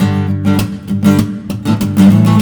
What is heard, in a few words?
Funky Funk